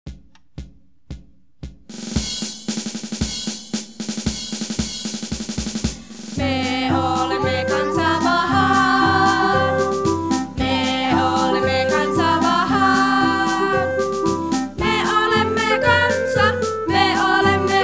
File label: Song, FI